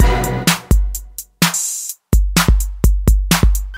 on strike, Drum loops Electro

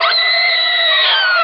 scream

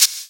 tug, shaker

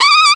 trumpet squirt; Notes

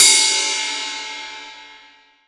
zoing, cymbals

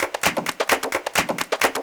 claps a lot; Drum loops

